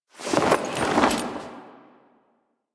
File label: Camp Canopy Flap